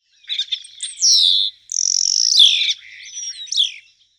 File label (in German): Einfarbstar Sturnus unicolor; Vogelstimmen